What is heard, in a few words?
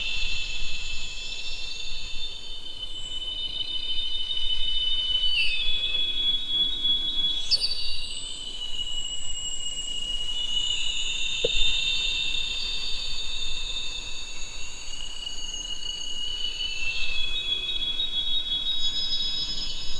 cinema tonal sounds